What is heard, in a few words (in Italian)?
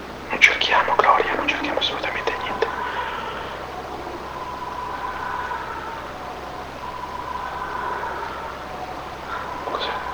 Canto risata; azzurrina